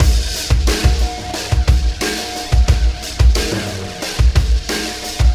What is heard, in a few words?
System Overload Beat, Processed Drumkits